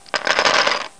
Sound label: domino, miscellaneous sounds; Sound Effects for Multimedia - Volume 1